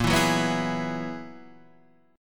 Gb 11th Bb

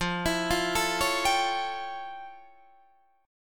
F Augmented Major 9th, Guitar, Open D; 15,19,15,15,16,17

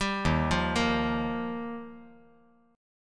D sharp Major Add 9th; chordshape; 0,3,1,1

